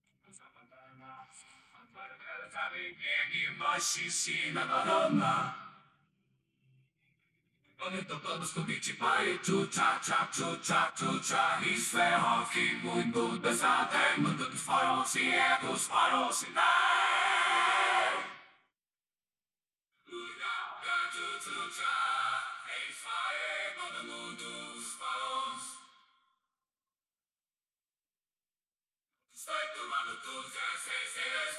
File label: Backing Vocals